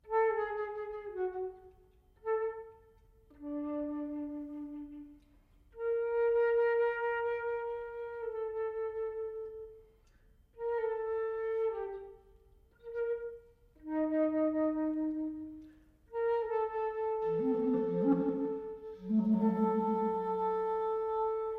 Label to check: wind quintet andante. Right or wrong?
Right